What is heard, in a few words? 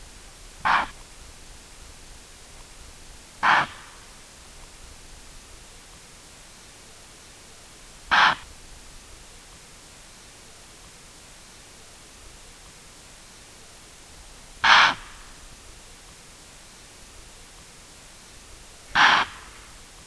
Aepyceros melampus male alarm; Impala; Loud alarm snorts of a male impala; Order Artiodactyl (Artiodactyla)